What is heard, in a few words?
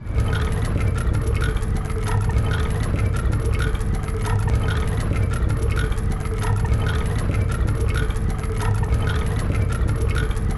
Stick Spin